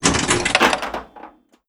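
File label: destruction pinball sounds; Explosions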